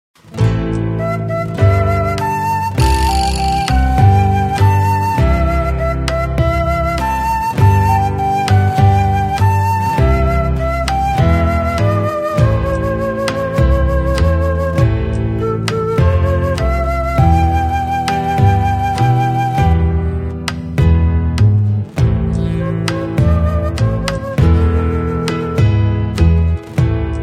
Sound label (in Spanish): pista, pistas musica latinoamericana